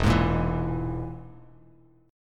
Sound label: E Major Flat 5th E, Bass Standard; strummed